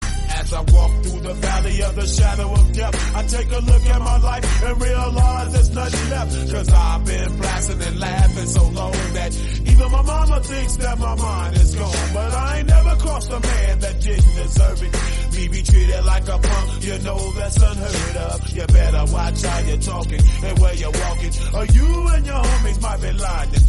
Bass Boosted English Song Ringtone

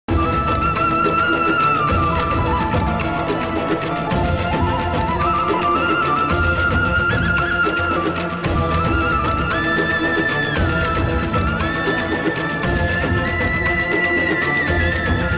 Radha Krishna Flute Ringtone; ringtones

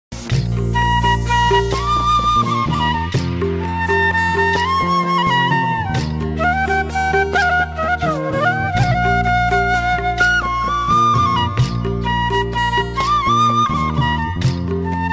Navratri Special Garba Flute Ringtone

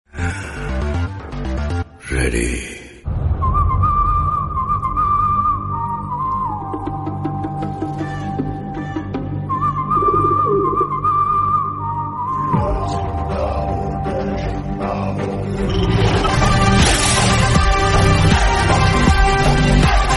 Trailer BGM Ringtone; ringtones; Jawan

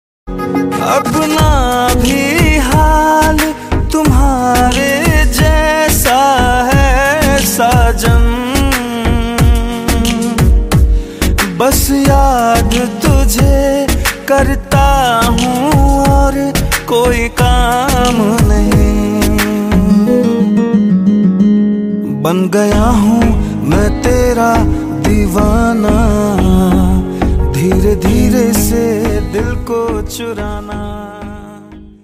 Old Hindi Song Ringtone

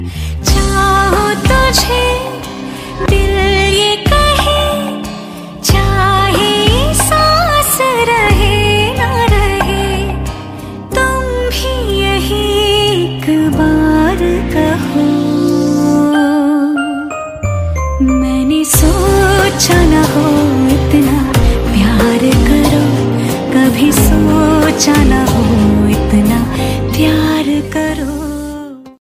Romantic Female Ringtone; ringtones

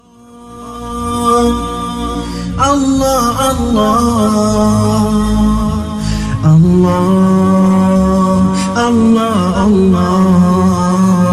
Islamic Nasheed Ringtone

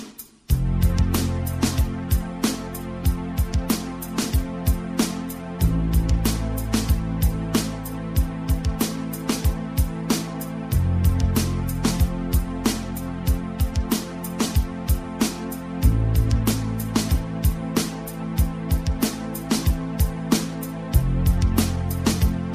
Music Ringtone

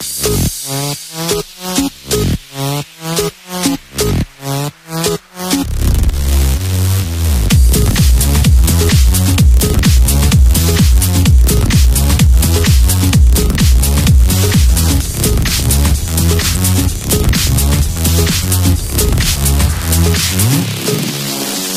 Ringtone For Cell Phone

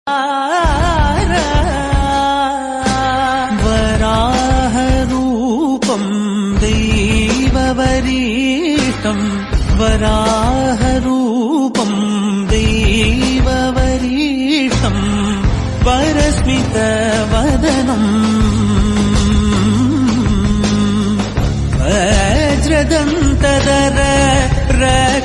Tamil Devotional Ringtone

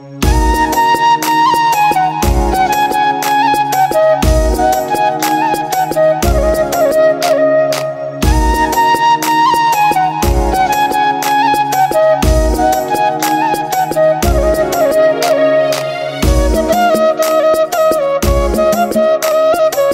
Flute Ringtone

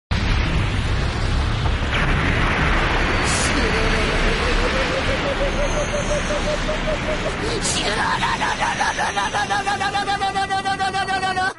Laugh Ringtone; One Piece; Caesar Clown

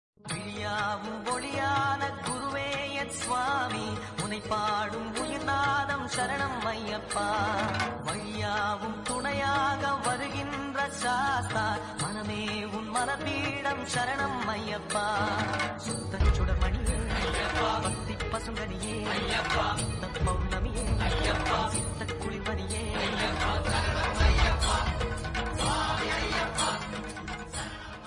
South Indian Ringtone

